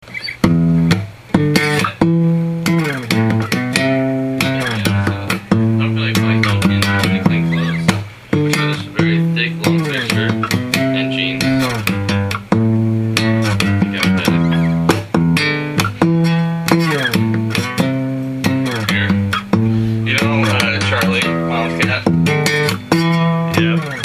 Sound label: bass line